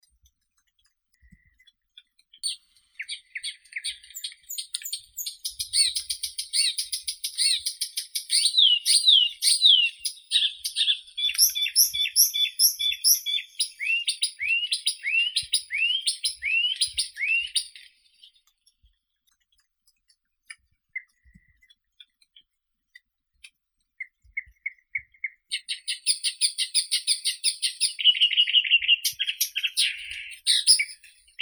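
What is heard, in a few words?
blyth's reed warbler, acrocephalus dumetorum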